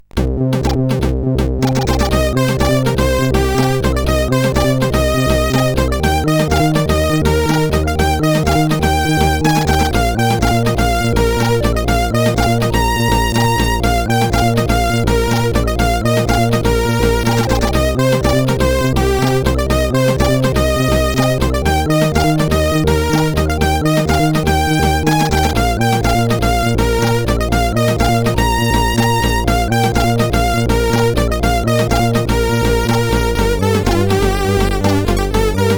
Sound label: Crack Intro, sid CSG8580R5; hvsc